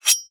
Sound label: blade scrape; razor